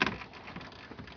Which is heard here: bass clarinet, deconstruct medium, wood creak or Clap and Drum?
wood creak